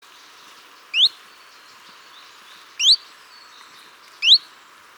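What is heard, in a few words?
Phylloscopus collybita, Chiffchaff call; Hanko, Uusimaa, Finland 6 October 2007; Linnut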